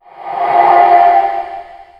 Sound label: death ghost fellbeast; dota; creep deaths